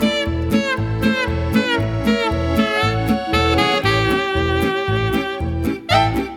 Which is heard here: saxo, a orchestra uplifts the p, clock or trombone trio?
saxo